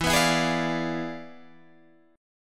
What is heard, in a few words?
E 7th Flat 9th Guitar Standard, chord; E7b9; strummed